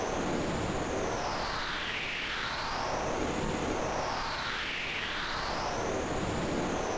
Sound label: white shadow mode loop